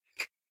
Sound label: Rat Squeak; SyrinScape